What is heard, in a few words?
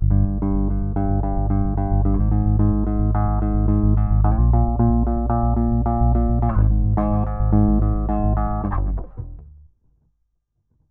BASS